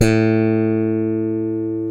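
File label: Electric Bass Slap, Roland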